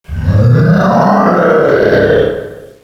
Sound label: "grrrawh", Echo inside caves, underground; DS; ML BOWSERS INSIDE